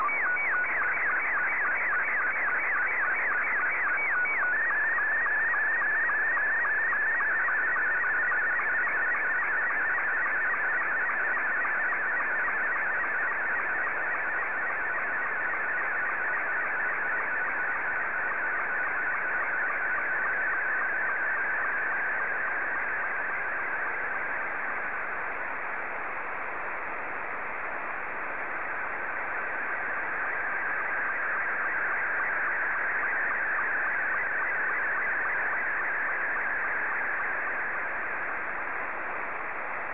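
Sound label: SSTV, Scottie 2; JK7BUM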